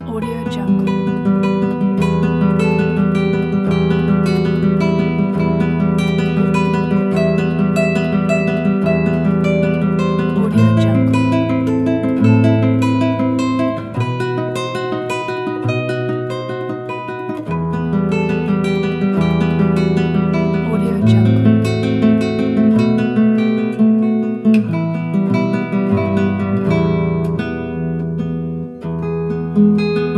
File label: romance